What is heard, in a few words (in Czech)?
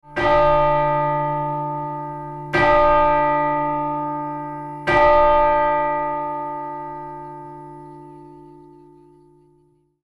zvon marie; zvony tony